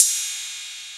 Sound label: Ride Cym 808, drum machine